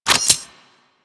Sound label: Scoop Idle Detach; Missions; Tenno Ball; Lunaro